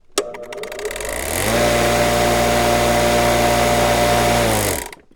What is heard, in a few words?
electric mower engine isolated running turn on off blade spinning machine hum; fvtt sonniss gdc audio